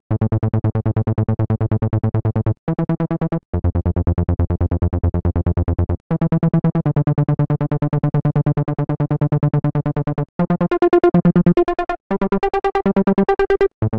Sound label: SYNTH